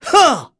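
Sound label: Vox Attack, Korean; heroes; Lusicas; kings raid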